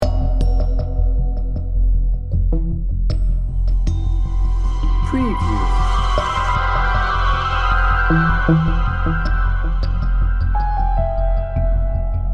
crime and suspense loop

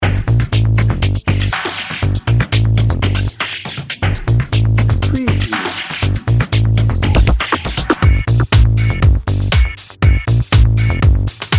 party in motion dnb

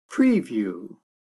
river ride loop

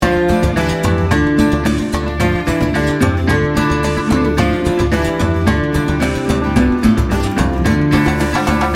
world traveler loop

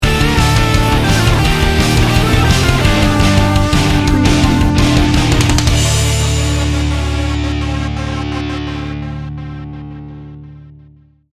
metal rock sting bumper

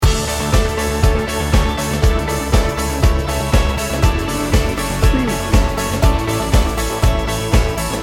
bold spirit loop

